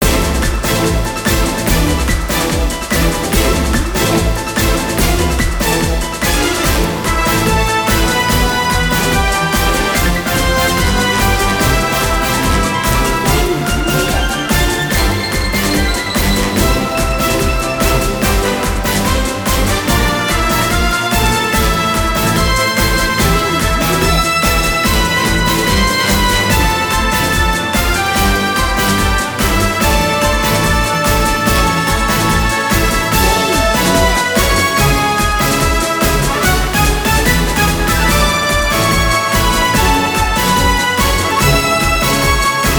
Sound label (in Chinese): 战斗; 敢达